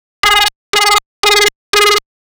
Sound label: alert